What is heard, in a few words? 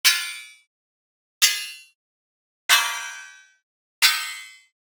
Digital Click Negative; boom library; The Interface